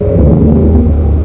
low thrum, ambience

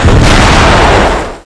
boiler explode; titanic

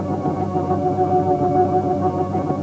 holodisplay; ambience

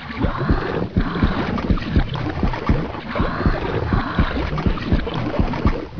tank birth

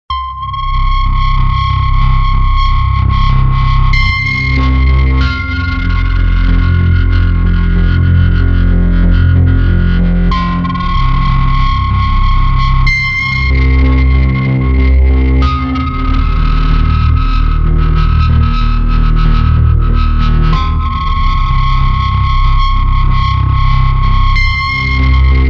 music tram tunnel

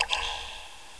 drip, ambience

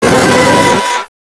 scream

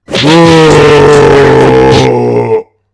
"uaah"

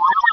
computer bleep; ambience

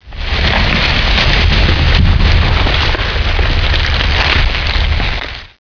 quake

